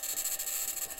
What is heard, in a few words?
mic, mars2020 edlcam